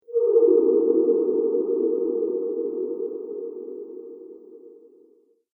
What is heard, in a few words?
Arcade Game Ghost Feelings; Video Game Sound Effects; Retro Inspired Sound Effects - Think Nintendo, Atari, C64